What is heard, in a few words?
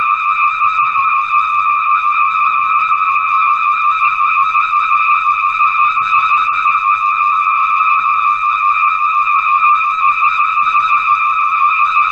loud futuristic alarm sound, laboratory